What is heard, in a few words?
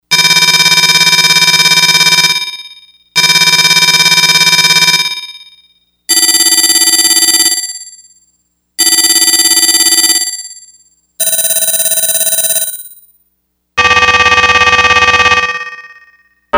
Alarm Call, Yamaha DX100; Factory Patches